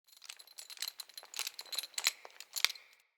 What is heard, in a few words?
Tent Ring Jingle, Interior; Soul Plane